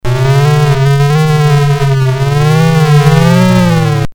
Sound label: ghosts